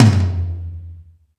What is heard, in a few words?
Toms 1, drum machine